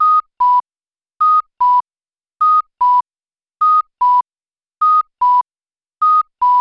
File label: Beaconing Cuckoo; accessible pedestrian signals